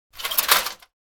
shopping cart move

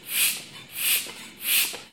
car foot pump

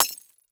glass hit

